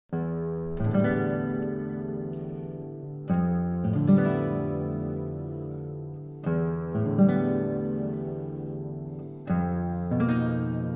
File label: five pieces for guitar with live electronics; IV. saudade